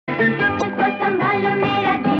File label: Ding Dong Ding Dong Ding Lala